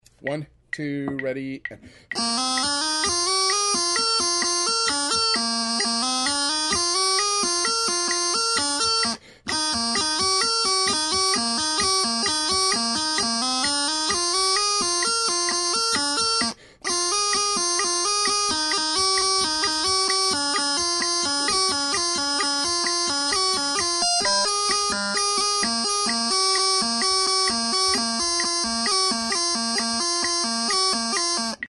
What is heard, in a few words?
Steam Train, bagpiper